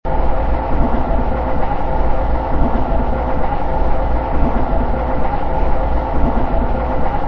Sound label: train loop